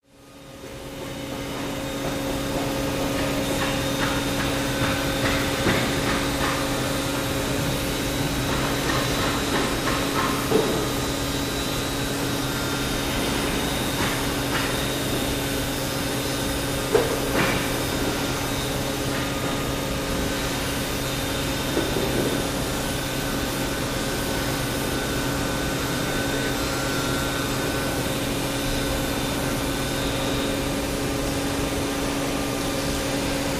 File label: Assembly Area Machi